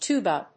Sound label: tuba